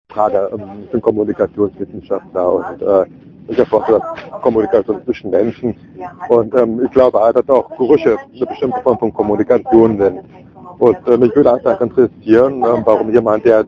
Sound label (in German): metronom